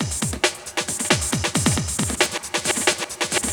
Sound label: drumloop; awe32